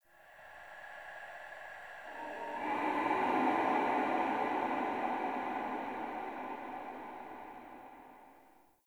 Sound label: Hub Texture, Ambience, Dungeons, Under City; Silent Rose